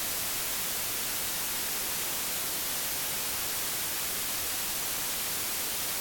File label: white Noise